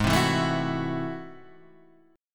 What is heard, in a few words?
A Minor Major 13th Ab